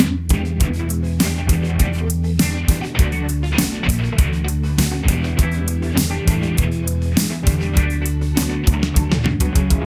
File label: rock style happy mood